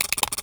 Nutcracker Turn

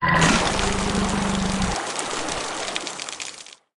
Mask Puke; Lethal Company

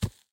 Step

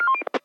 Walkie Talkie Transmission Off

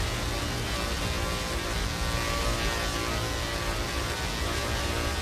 shock beam

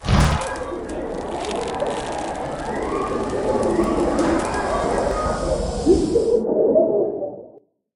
Mask Comedy Attach; Lethal Company

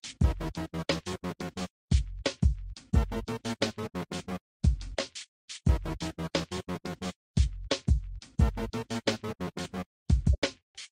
Boombox Music TV Version

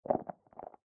Rock Outside Ship

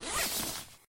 Change Suit

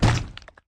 Ram

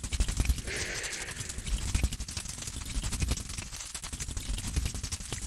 Centipede Walk; Lethal Company

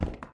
tile

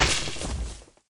Blood Gore

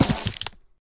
Spider Attack

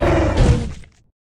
Crawler Die

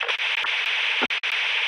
Walkie Talkie Cut Out

